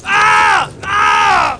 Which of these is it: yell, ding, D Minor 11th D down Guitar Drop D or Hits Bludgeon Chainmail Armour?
yell